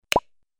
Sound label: hard pop click